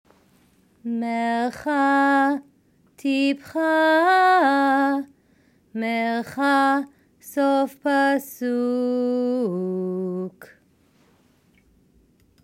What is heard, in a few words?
Sof Pasuk System; basic torah trope; bnai mitzvot